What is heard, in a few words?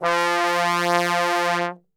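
F2 bass trombone, Brass; Best Service; Brass Super Section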